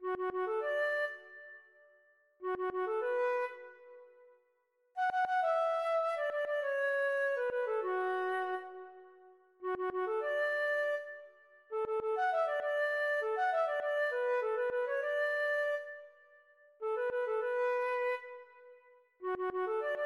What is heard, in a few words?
tapuz, feadóg